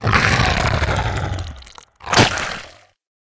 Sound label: giant scorpion dead, monster